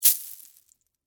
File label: Debris Drop Cereal, Corn, Flakes; Texture; BlastWave